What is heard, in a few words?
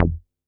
E Mud Thudd Bass